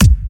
original bass drum g key, Royality free kick drum single hit tuned to the G note